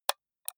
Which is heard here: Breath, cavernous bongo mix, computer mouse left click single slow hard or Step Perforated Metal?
computer mouse left click single slow hard